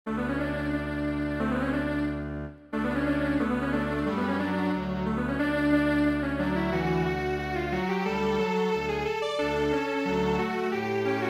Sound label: Strings Quartet